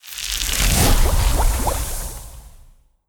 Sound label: Succubus Infect